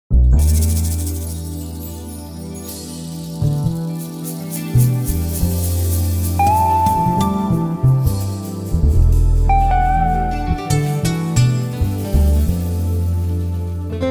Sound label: acoustic